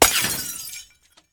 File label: rupture bulb impact; sonniss gdc